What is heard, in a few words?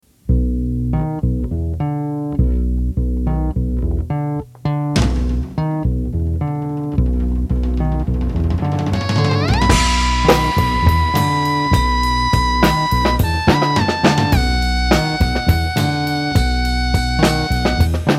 Groove Jam, bongos